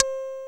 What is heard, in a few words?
E-GT HI, INST WAVES